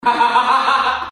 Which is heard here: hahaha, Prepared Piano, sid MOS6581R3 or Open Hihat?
hahaha